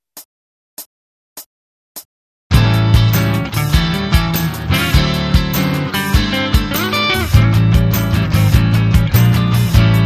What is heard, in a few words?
blues, guitar